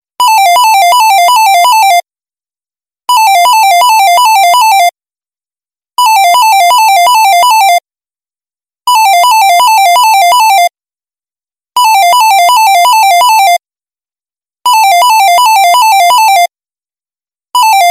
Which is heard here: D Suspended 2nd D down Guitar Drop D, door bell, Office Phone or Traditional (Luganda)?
Office Phone